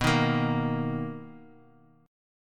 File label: B Major 7th B down, Bass Standard; strummed; JGuitar